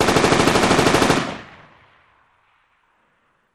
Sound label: Machine Gun Sngl Rap, single burst